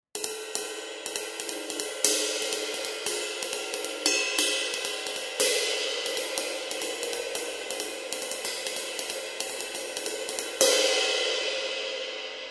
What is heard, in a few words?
medium ride cymbal; 22; Vault Artisan; Sabian